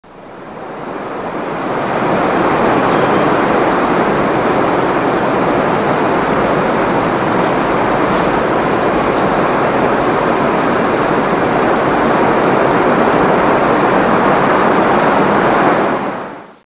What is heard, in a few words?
rebar wind; ambience